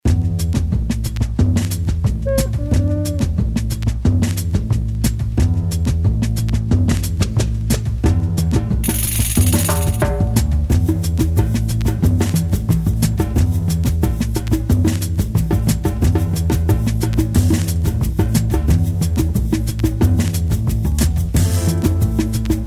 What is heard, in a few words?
BOSSA